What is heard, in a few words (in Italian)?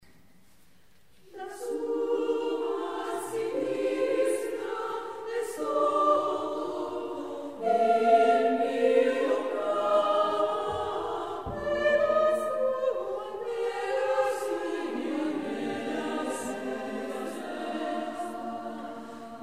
Madrigale, Coro femminile; La sua sinistra